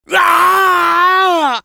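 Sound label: pain crticial death, French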